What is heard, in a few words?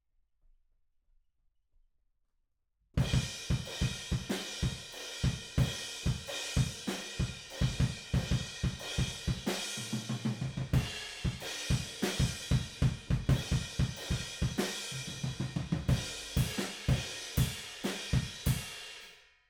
Room